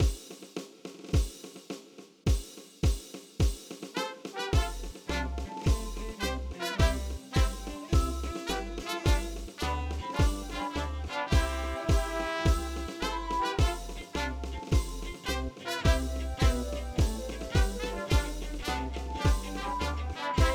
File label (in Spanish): HIMNO INSTRUMENTAL; HIMNO A CHAMANGA; simbolos patrios